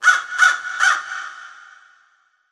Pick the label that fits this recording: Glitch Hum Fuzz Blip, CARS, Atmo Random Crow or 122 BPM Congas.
Atmo Random Crow